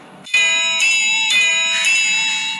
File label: ending bell; keijo